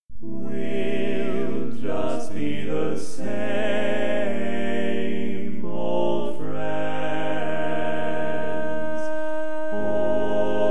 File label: Bass, barbershop